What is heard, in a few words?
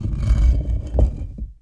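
door close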